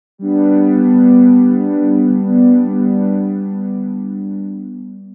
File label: Blanket Pad; jup8